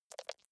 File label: click erratic wide; signale